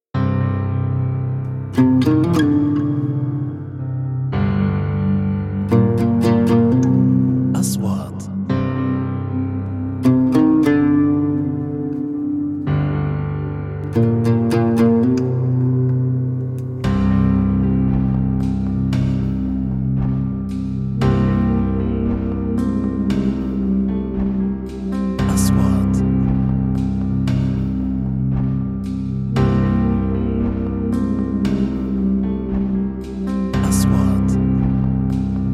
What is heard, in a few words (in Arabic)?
موسيقى وثائقية; documentary